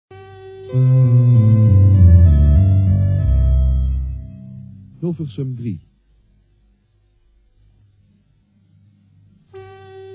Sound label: interval signal; nos hilversum3 1974